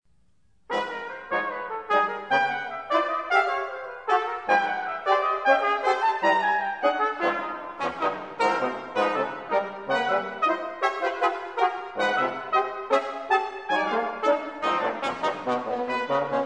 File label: quartet brass